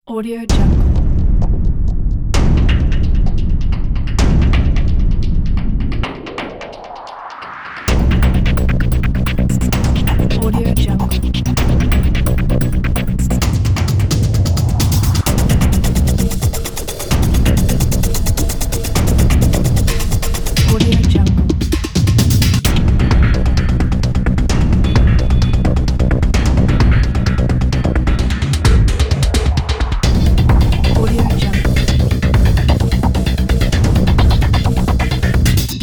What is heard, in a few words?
energetic drums